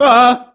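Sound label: sounds with the scream of a burning person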